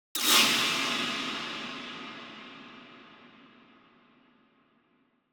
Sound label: Impact Metallic Thin Dissonant Impact; Hollywood Edge; sound designer's toolkit v2